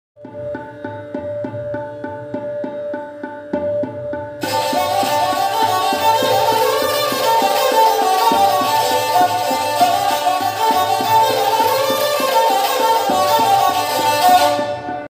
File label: sarangi